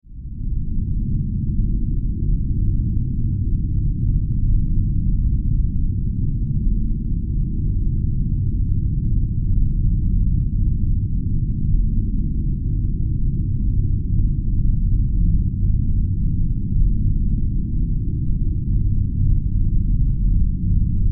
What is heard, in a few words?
Science Fiction Electrical Power Source; Sound Design